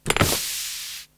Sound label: Shelf Used; Atelier